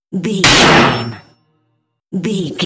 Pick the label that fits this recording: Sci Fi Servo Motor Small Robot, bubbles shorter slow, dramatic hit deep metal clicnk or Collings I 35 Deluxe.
dramatic hit deep metal clicnk